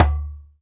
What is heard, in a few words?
Perc Djembe Bass; Circ Afrique V4